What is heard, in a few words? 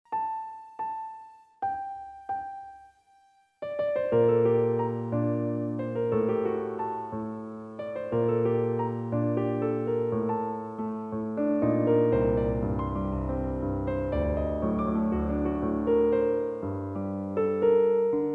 Piano